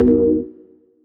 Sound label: Synth Cuts; Vengeance Samples; VES1 Instruments